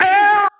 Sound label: vocal shot g#; MINDMINE